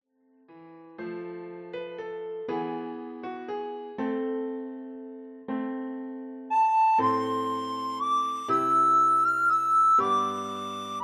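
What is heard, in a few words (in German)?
Greensleeves Blockflöte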